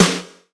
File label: shiny acoustic snare f sharp key, steel snare drum